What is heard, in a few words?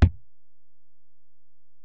Ac BD, Alesis DM-PRO; 300 Drum Machines